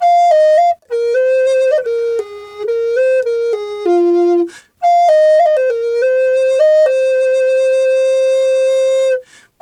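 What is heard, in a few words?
flute, 100 BPM; Didgeridoo and Other Primitive Instruments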